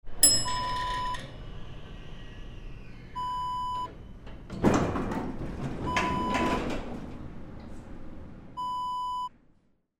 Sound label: elevator bell ding